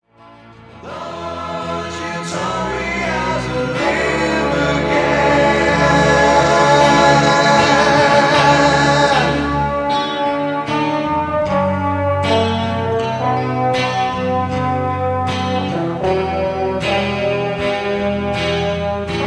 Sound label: trumpet solo; Project Adventure; Pinetrax